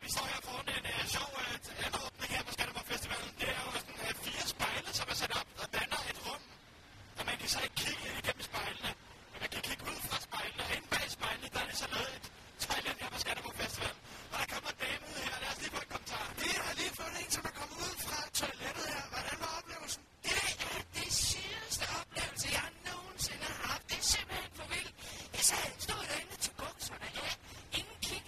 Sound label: Toilet